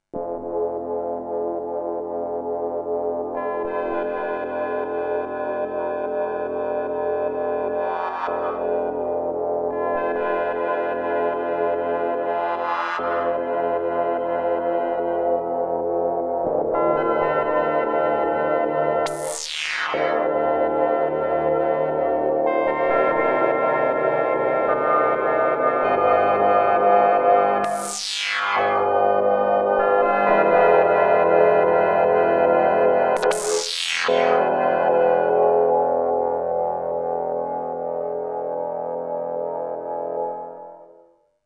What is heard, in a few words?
Alesis A6 Doing Prophet like sound